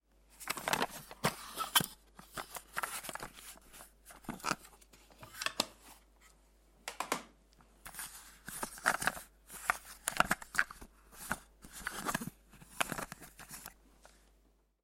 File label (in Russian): vskritie i obrashenie s kassetnoj plastikovoj korobkoj